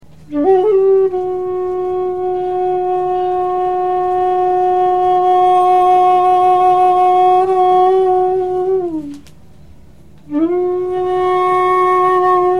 flute and tabla, Field Recordings from India; WFMU

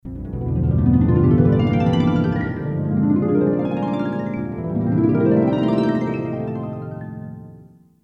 Miracle Jingle; Jingles for films podcasts

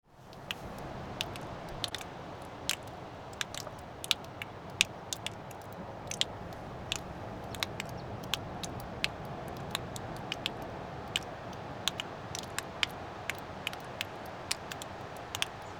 Drops; AUSTRALIA; Les Cartes Postales Sonores; NOISE ON EARTH Vol7

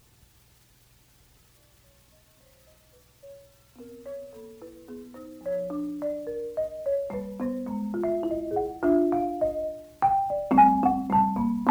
Marimba Interlude

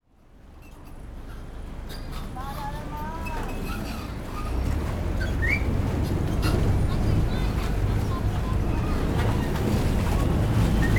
On the boat, THAILAND; Les Cartes Postales Sonores; NOISE ON EARTH Vol3

